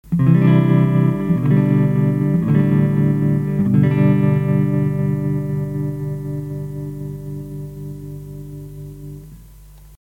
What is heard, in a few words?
TOREMOLO TURTLE ON; まずは「TOREMOLO TURTLE」だけの音です。; FUZZ DEVIL